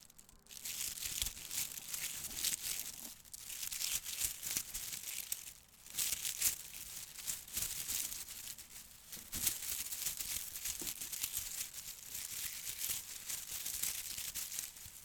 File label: Rustling Bushes